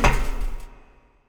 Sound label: foot metal; Earth and Beyond; EA Games